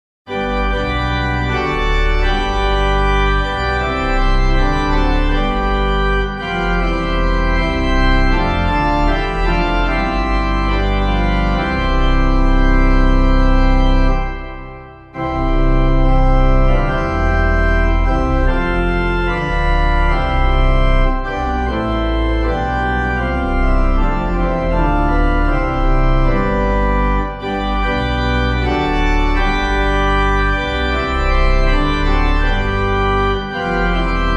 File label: organ, hymn; Bright as the Sun Fair as the Moon; Glen; Clyde McLennan